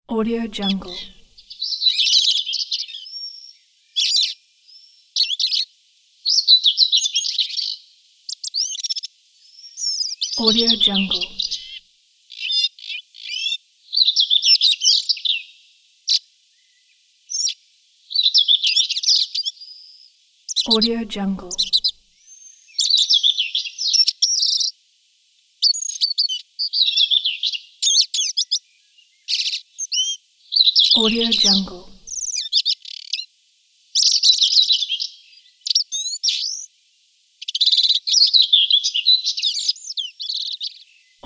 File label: early spring forest birds ambience